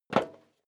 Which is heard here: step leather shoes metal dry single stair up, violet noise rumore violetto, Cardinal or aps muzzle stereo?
step leather shoes metal dry single stair up